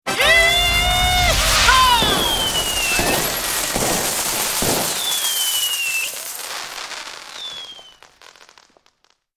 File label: Jackpot Winner, Man shouting ‘Yeah-ha’ with fanfare, crowd shouts, applause, coins falling; and fireworks; Yelling; Voice